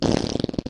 Fart

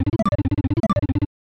Unused Jingle; Super Double Yakuman; SNES

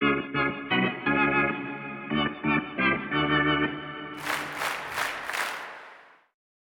organ

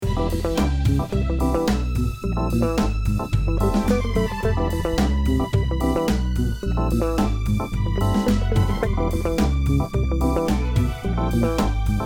Disco Battle; YIIK A Post Modern RPG

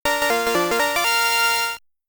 Extend Fanfare

